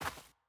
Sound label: Footstep Grass